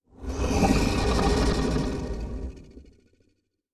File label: Large Creature Long, Monsters